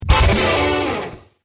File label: Success; kickbuttowski; mellowbrook mayhem